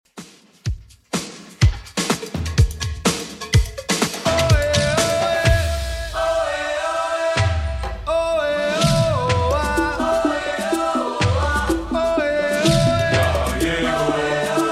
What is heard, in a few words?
80s Redrum 125bpm